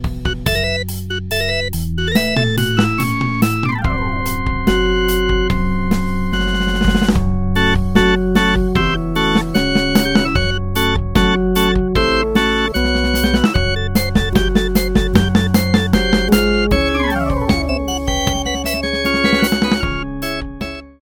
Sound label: rotary organ